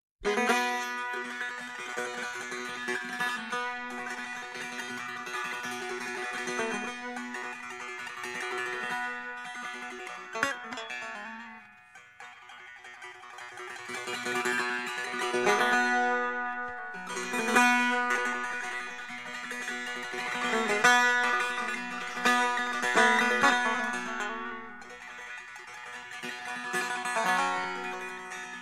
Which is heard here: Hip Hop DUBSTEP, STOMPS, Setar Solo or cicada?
Setar Solo